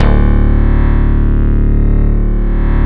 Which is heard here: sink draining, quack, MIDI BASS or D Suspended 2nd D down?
MIDI BASS